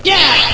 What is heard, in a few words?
jump; MMX4SFX